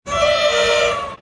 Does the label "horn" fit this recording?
Yes